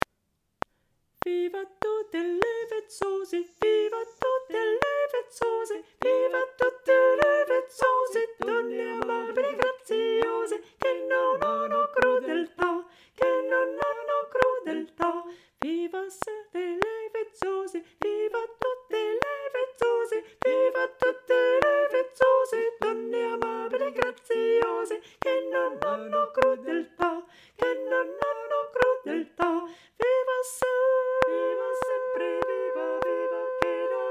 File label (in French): Sopranes; Viva Tutte le Vezzose